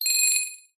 multimedia game tone sci fi futuristic beep high pitched collect